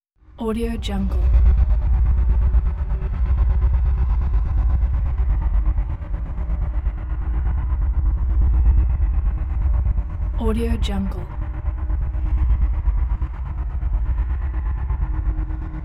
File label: scary tunnel ambience